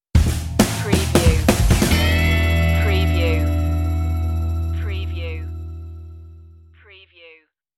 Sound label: dorm room stinger